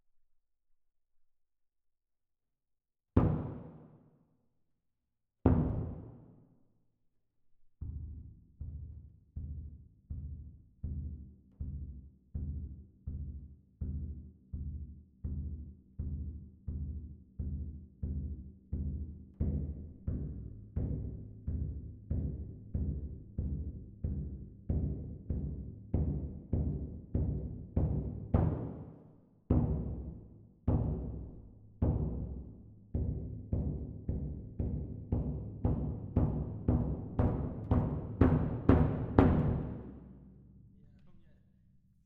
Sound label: Perc